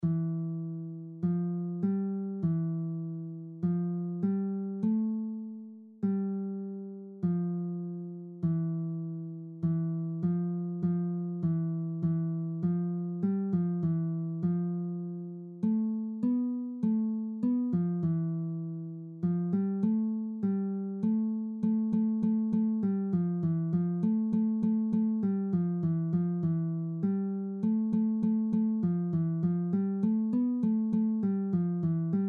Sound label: slow part 200bpm